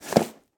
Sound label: crush box; sonniss gdc